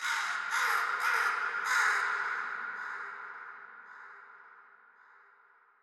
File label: crow, ambient, nature, birds; Portal 2